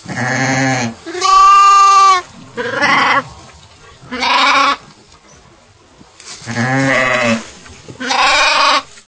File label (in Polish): owca